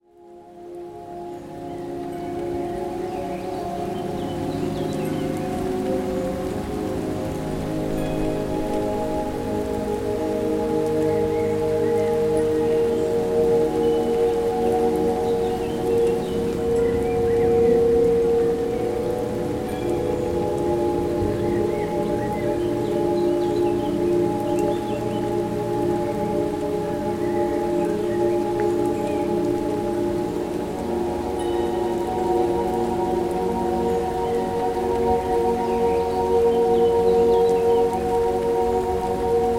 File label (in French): Reiki ambiance zen; Spa